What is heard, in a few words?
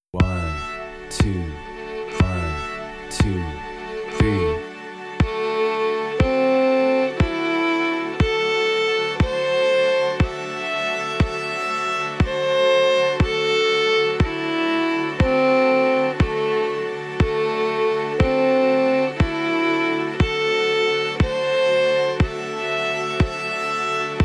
minor triads A Minor Single Bow, fiddle; scale central